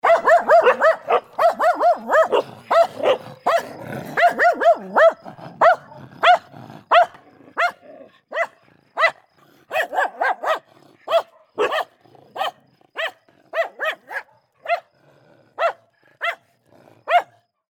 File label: dogs barking to each other